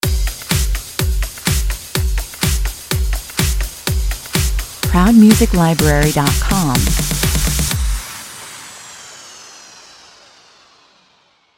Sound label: pop stinger